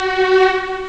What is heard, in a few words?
Strings, Amiga Soundtracker